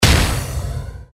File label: hit center; XElements